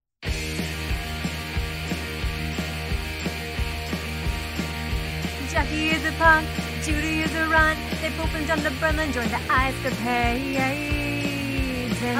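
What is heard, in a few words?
karaoke, female; F4A; GWAB Singers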